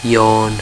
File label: YAWN; Pranger